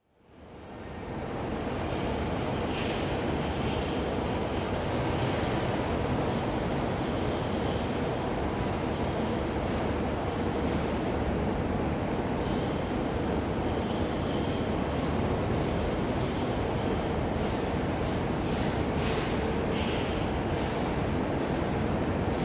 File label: Main Railway Station, with indiscernible PA announcement & arriving train; BBC